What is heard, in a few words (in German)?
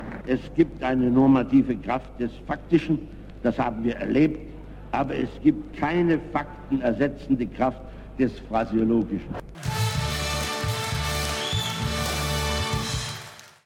Tröte